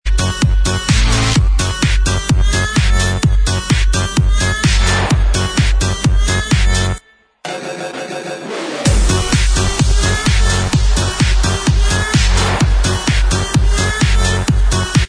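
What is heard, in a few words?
remix instrumental, backing track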